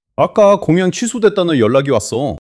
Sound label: surprise